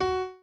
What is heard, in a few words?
piano chord